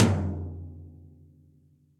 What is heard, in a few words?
Tom Low DeepSnareDynamics; drum hits; Ableton; Factory Packs; Session Drums Club; Standard Hits